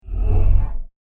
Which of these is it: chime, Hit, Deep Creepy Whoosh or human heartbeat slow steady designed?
Deep Creepy Whoosh